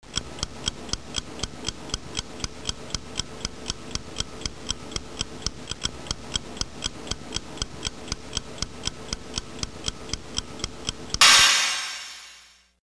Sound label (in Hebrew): שעון לחידונים